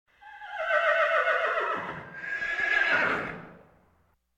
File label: Animal effects snorts grunts farm ambience